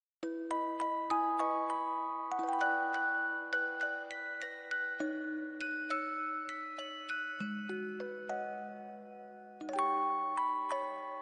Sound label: music box ver.